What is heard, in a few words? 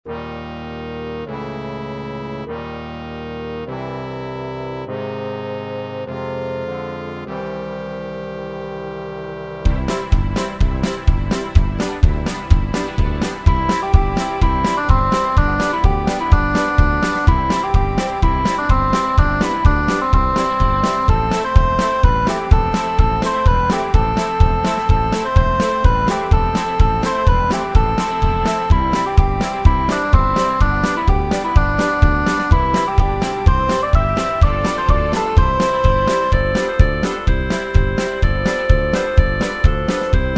music